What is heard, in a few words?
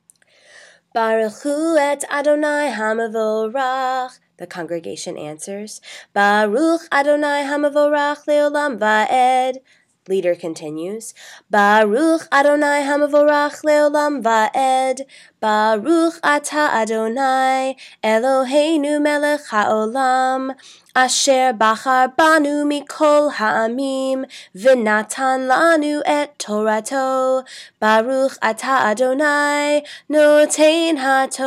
Blessing before Torah reading